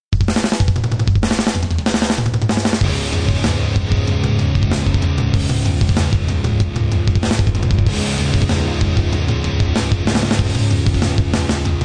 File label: hard Drums; battle of the bands